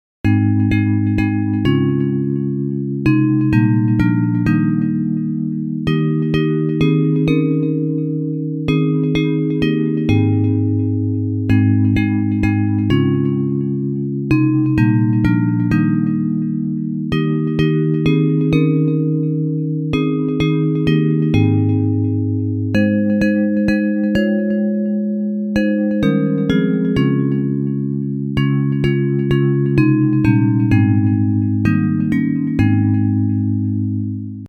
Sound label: Bells